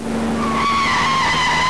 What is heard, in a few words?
car; DTMedia; Studio⁄1